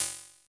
Visco Space Drum; DRUM MACHINES

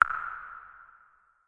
urban trap percussion verby pop; Urban Trap Kit; Hip Hop; STYLE KITS (Hip Hop, Etc)

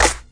snare

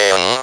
speak and spell M; ALPHABET

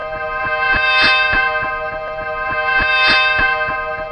harmonic loop hi; LOOPING AMBIENCE

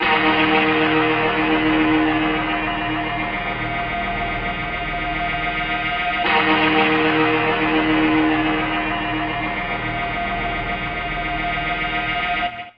never ending guitar

